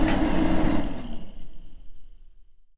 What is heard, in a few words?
pc off